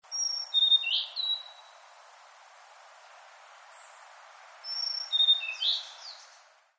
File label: oruri